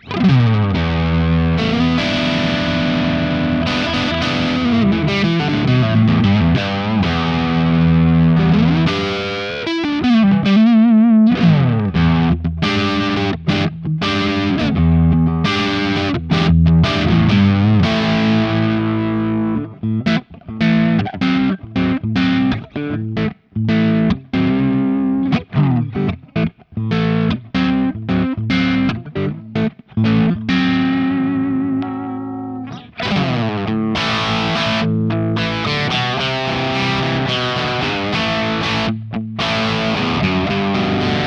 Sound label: DC DLX Crunch Splitted Humbucker; Harley Benton